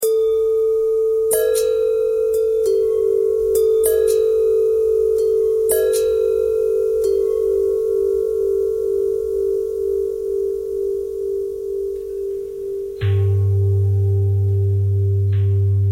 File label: long bell bell threads, bells